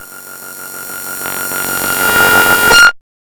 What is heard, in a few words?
SONAR, Hard & Loud Techno; USB Soundscan vol.01; AKAI